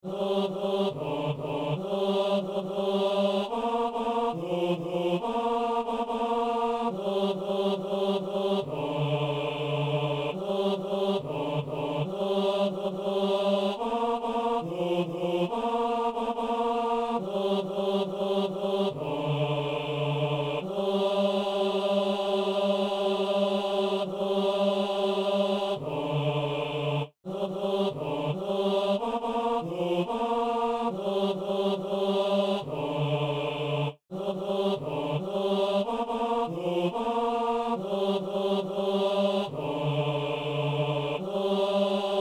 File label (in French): basse; Messe de San Lorenzo - Sanctus